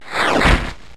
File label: Rock Throw; pokemon reborn e19 2022